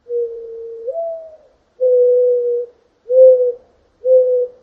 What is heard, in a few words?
mouring dove; ambient